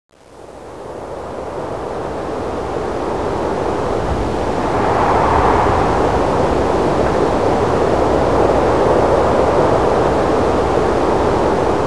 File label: gusty